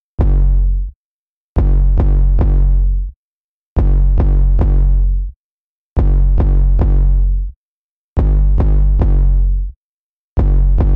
Bass

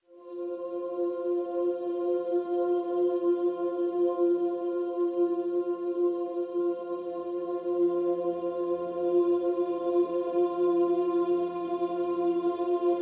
Pads Vocalicious (F)

